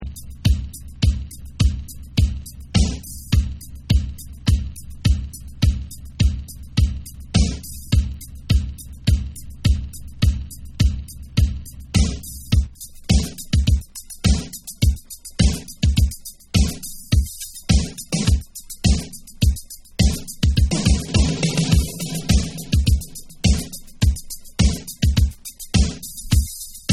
Bonus Beats Version

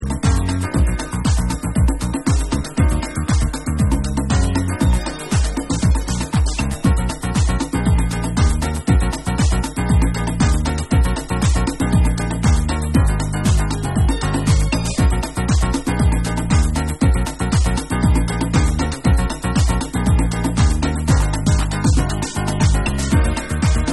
Instrumental Mix

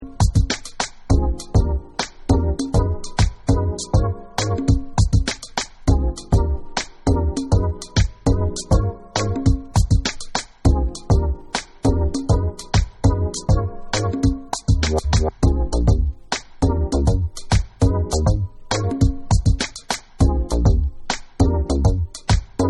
Instrumental Remix

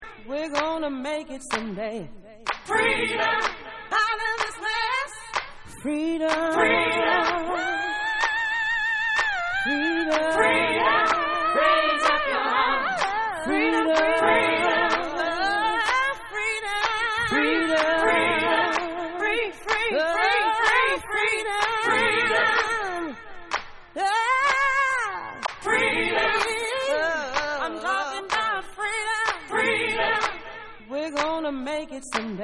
Chorus Acapella

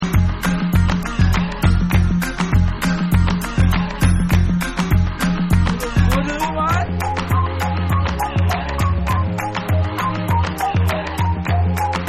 Instrumental

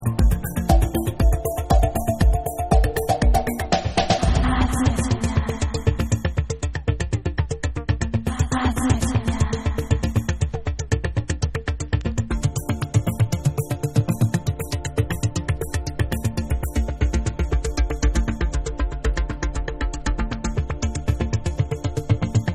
Techno Trip Mix

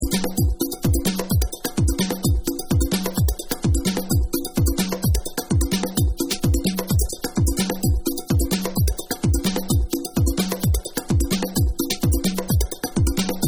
Beats

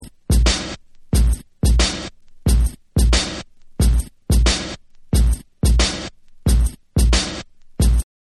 Breakbeat

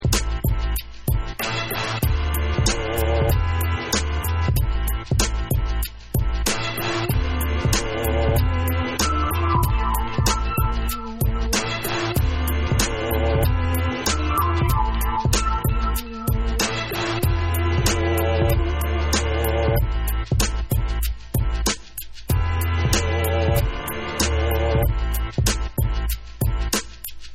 Instrumental

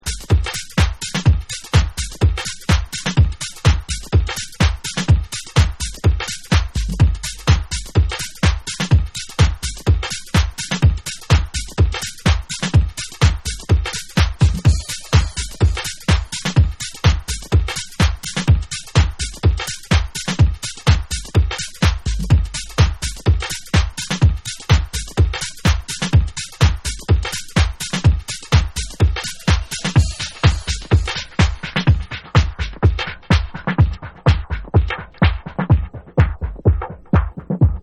Robotic Beatz

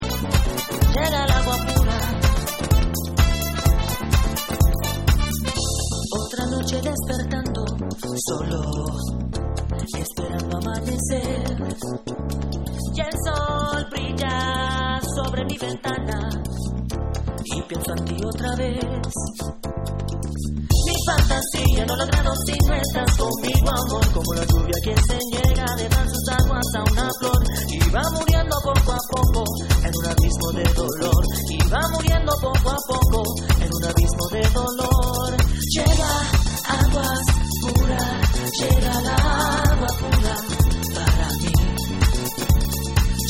Latin Club Mix

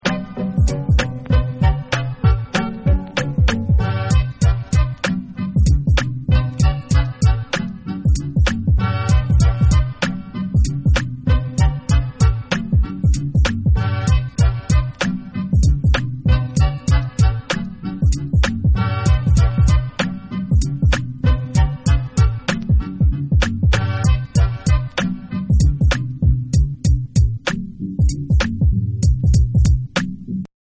Instrumental

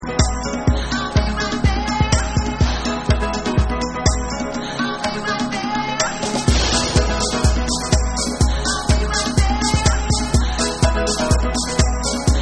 Piano

